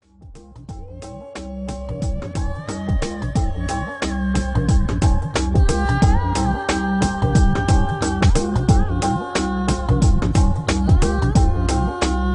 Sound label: Chill Out Music Egypt Arabic